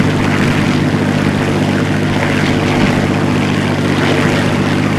mustang rear, engines; War Thunder

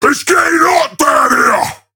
Alarms Fire Alarm; Ork; World Of Warships; Warhammer 40000

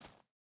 footstep barefoot forest jump start; Rust

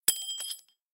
shells 20mm ground; tanks; War Thunder

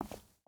footstep hide concrete walk; Rust

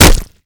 Projectile Sounds; Red Orchestra Ostfront 41-45

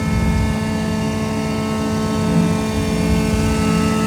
turret, tanks; World of Tanks

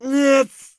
hit; Escape from Tarkov; scav2

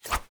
knife bayonet swing; Escape from Tarkov

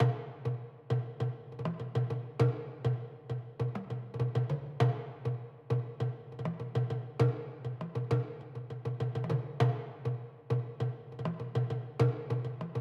music interactive; World of Tanks

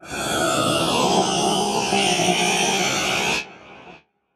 ship engines; World Of Warships

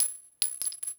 tungsten shell bounce hard

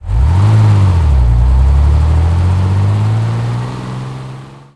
valentine engine load

